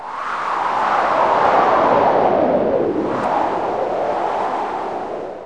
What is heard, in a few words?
Wind; Clandestiny of Elsie